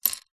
leisure lego piece single set down on wood table

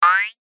cartoon spring boing jaw harp ascend thin

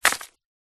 rock small place down on others pile; foley

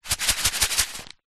food small packet of cous cous shake; zapsplat

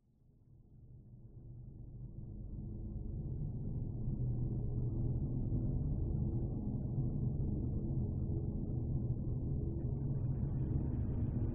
horror atmosphere dark droning lfe scary; scott lawlor

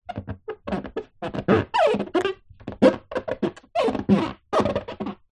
sport surfboard body hand rub squeak

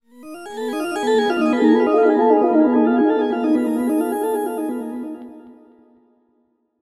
sound design mysterious notes organ delayed

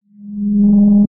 Riser Sci Fi Abstract Glowing Short; Skyes Audio

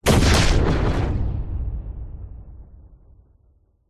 explosions large explosion

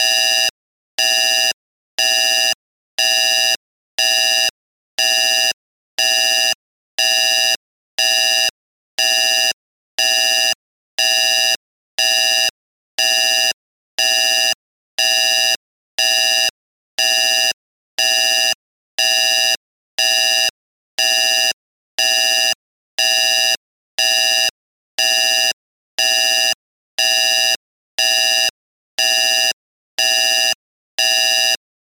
science fiction console alarm; sound effects one

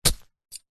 warfare knife stab into soil dirt single

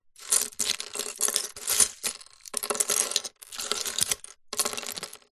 leisure lego pieces movement on wood table

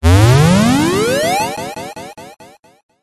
cartoon ascend retro vintage synthesized

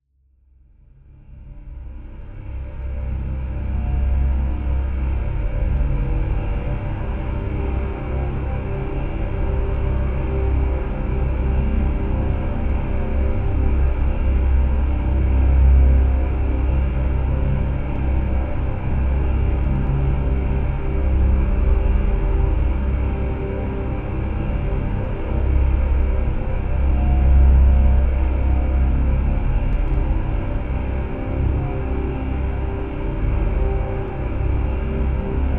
sound design horror drone atmosphere

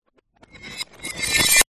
reversed broken glass; sound design transition; zapsplat

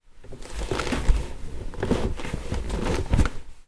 household person movement bum seat

